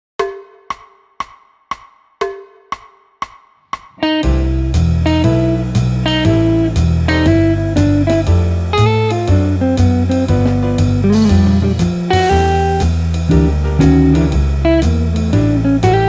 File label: air jazz